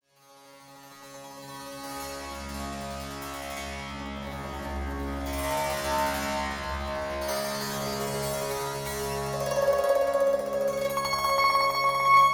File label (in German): doppeltes monochord